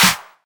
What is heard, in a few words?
clap sample f key; percussion; single hits one shots